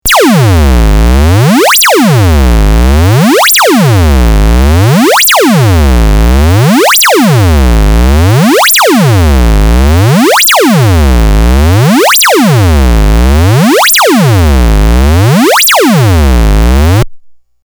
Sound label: arduino sweep very fast